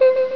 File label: Score Up; Megarom Macintosh CD Software; Quantum Leap